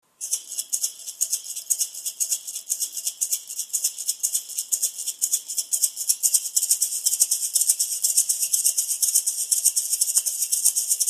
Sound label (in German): Flaschenkurbismaraca